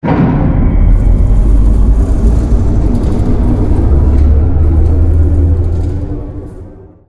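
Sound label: Ambience Train Startup Caboose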